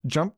jump

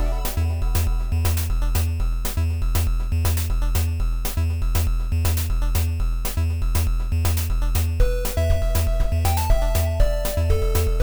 loop

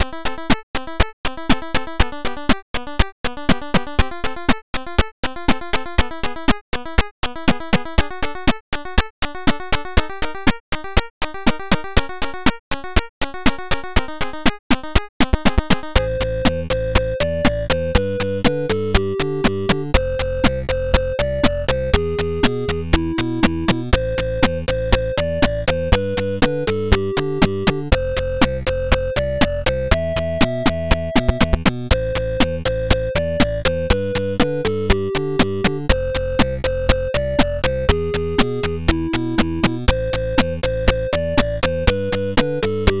Jumping Bat SCC

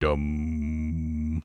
powerup

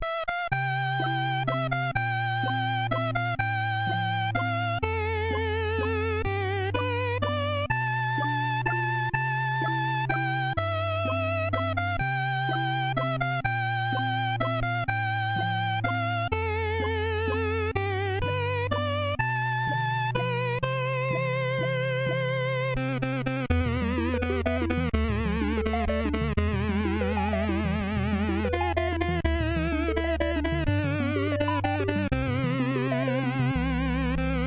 sailor waltz with water effects c64 style

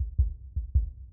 heartbeat fast reverb

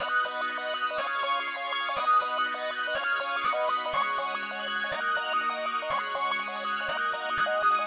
SWINGING CHORD

